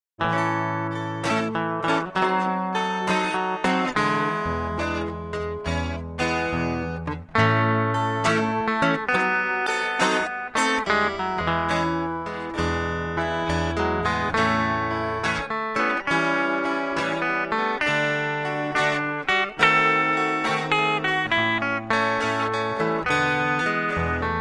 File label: Loop